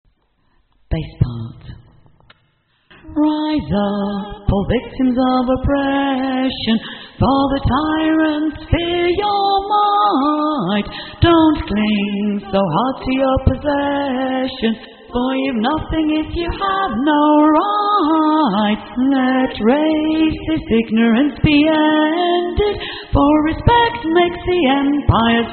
Bass; manchester

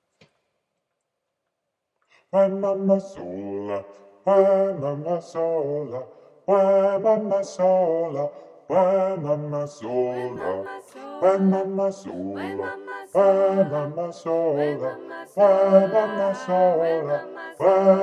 Bass Harmony; Extinction Rebellion; Mamazola

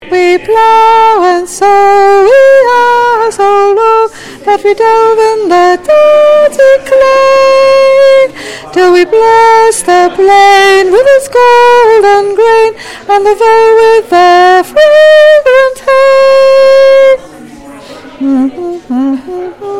Tenor

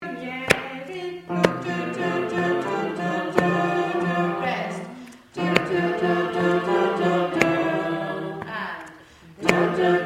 Bass Tune

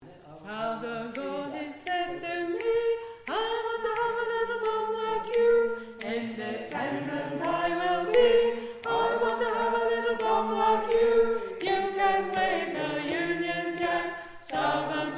Tune

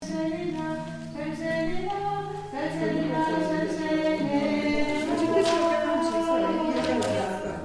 Alto

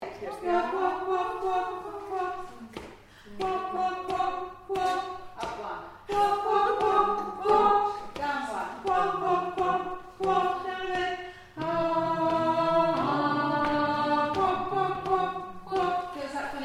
alto

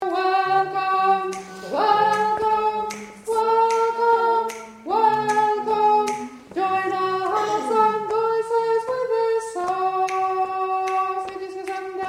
Bass

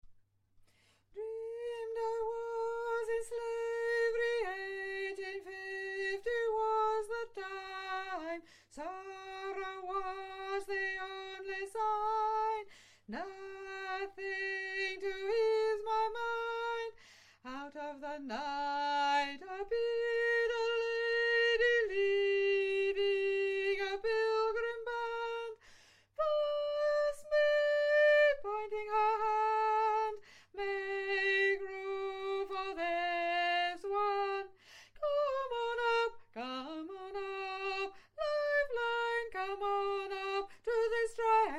solo tenor

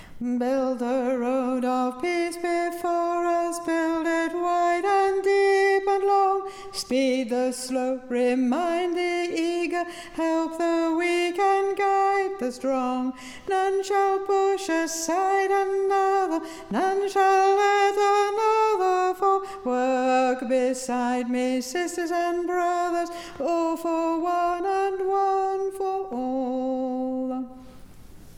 Bass

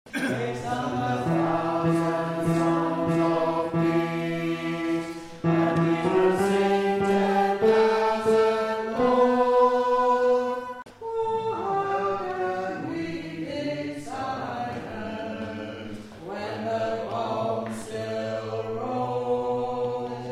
bass

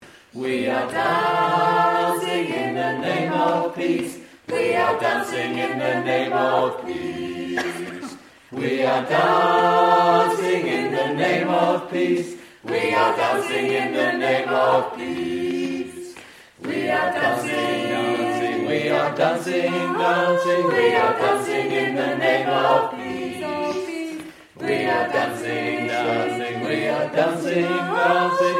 Alto

